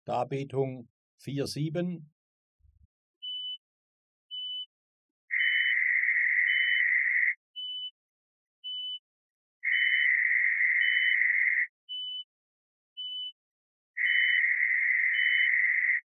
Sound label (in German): Tonhöhenverschiebung, Die Tonhöhe eines Sinustones; Die Tonhöhe eines Sinustones bei 3